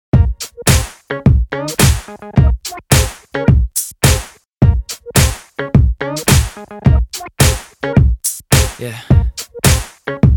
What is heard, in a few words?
bpm 107